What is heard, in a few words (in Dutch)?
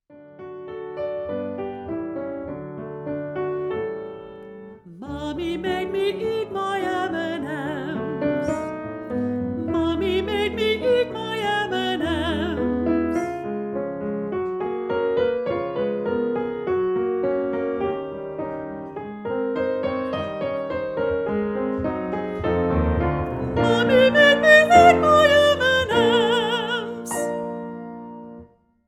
vocalise, zangschool